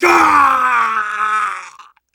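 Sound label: Sniper pain critical death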